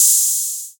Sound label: reverb trap open high hat sample a key, Royality free open high-hat sample tuned to the A note